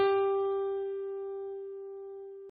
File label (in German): Ton, Klavier (einzelne) Töne